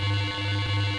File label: Radar Growl